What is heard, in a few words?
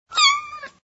Miau; Кошачее мяу.